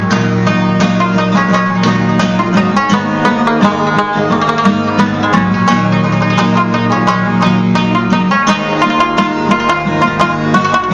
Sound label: Rabab